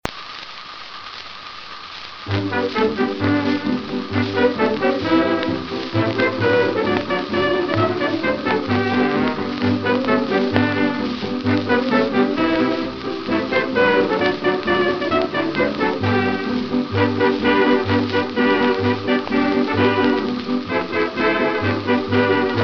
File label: Jingle Bells